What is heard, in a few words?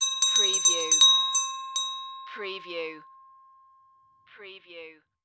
Hand Bell Hit Ring